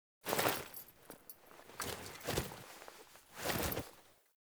Foley Action Default Fire Sword Equip, Foley Default Clothing; Characters; Player